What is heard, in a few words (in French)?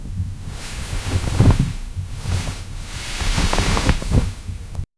frotement superdoux et le, pillow